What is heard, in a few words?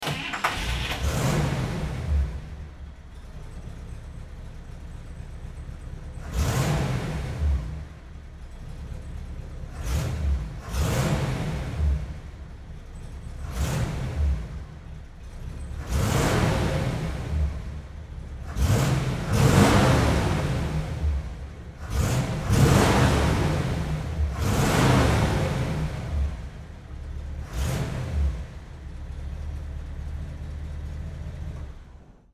Auto Vintage Big Start-Stop